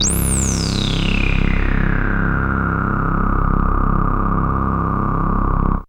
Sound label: FILTR BASS